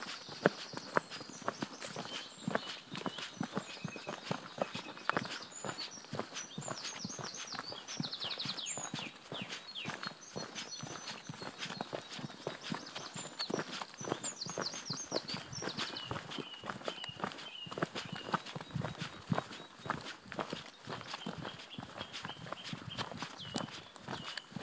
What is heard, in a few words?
sark soundscape walking down the hill to the harbour